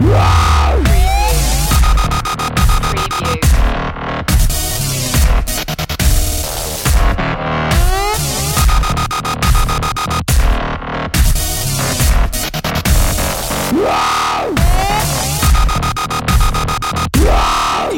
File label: dubstep loop